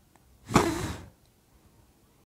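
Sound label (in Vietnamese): tiếng thổi nến thời điểm lửa tắt; tieng thoi nen thoi diem lua tat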